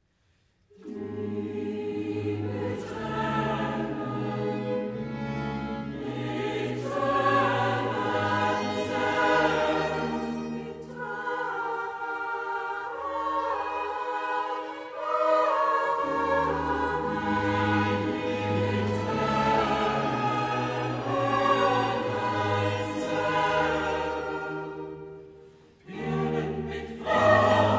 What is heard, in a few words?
Chorus; JL Bach